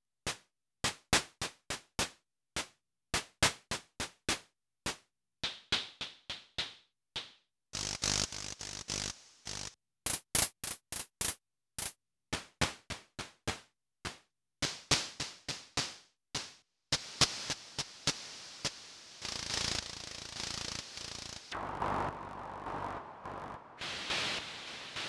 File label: Fire Instruments XCLAP